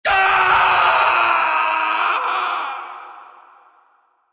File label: scream